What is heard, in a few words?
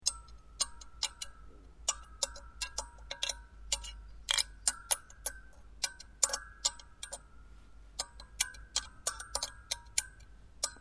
piano